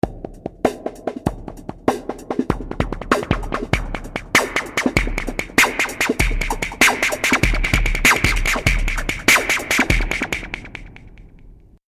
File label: Drums Cry Mama